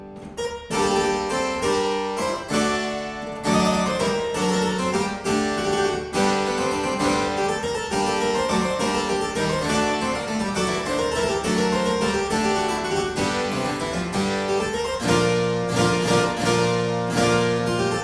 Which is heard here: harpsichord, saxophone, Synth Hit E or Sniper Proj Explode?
harpsichord